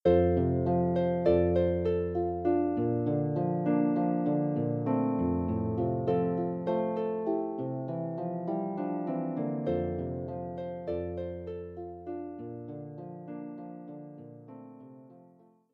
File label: Harp